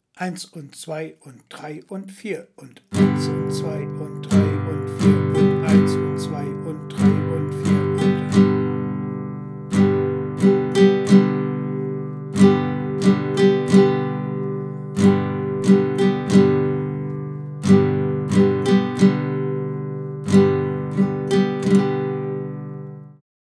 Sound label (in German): Schlagmuster